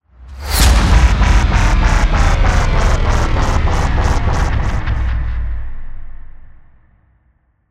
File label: Trailer Tools Bass Drop; Production Music; CATastrophic